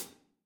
drumset e; Golden Crayon Sounds

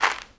Bubble appear; ESAN VO Sound Schemes; ESAN Horror pack

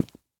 marble step; Golden Crayon Sounds

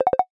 Sensitive Content mono, iOS Sounds

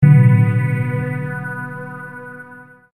lose; RS Games Sounds; Rummy

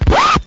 skip; RS Games Sounds; Uno

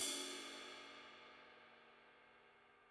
drumset g; Golden Crayon Sounds

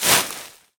leaves land; Golden Crayon Sounds

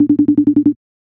ringing; iOS Sounds

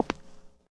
concrete step; Golden Crayon Sounds

